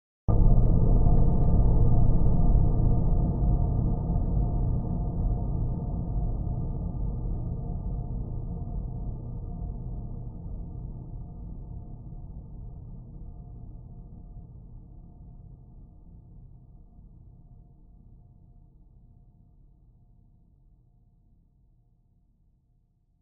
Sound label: horror hit stab dark scary fear thud